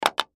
Button Switch

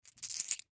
Ball Bearing Multiple Hand Roll; Foley; Rolling; Objects; Blastwave

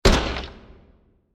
Small Impact With Dropping Particles; Impacts; Blasts

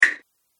Water Button, button sound effects; multimedia

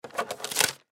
Paper Cutter Removed Cut Paper From Tray; Office; Blastwave

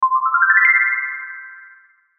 Logo Ascend Reverb; Logo Ascend Twinkle Reverb; Sonic Logo

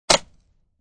Thin Wood Cracking, Thin board is cracked slowly; Objects

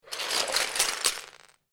Lego, grab several lego pieces; Miscellaneous Toy Sound Effects; LynneMusic

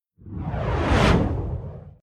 Whoosh Fireball Long Sweep By Left To Right Flame; SFX Title Graphics; Whoosh Sound Effects

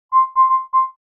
Beep And Bleep Sequence

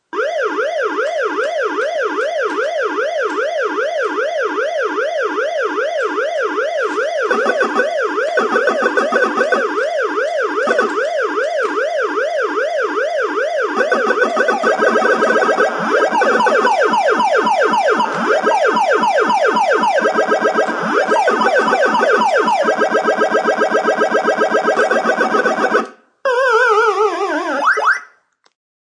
video game; Playing PacMan video game; Video Games (Sound Effects:Games)

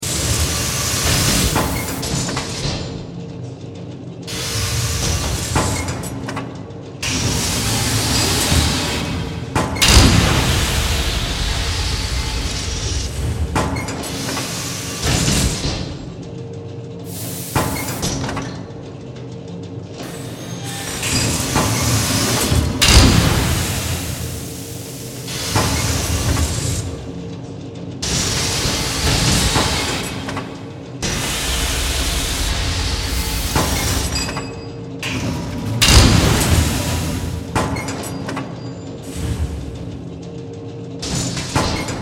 Factory Machines

